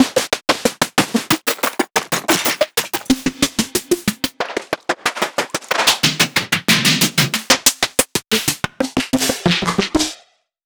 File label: Snare Pack